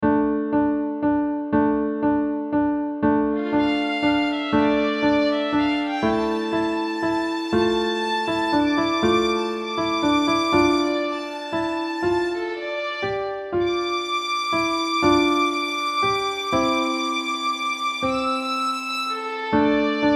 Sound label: midi performance